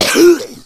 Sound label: hunter pounce cancel, voice; player; source engine; left 4 dead 2